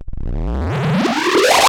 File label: Harsh Back Zap C3; Club Techno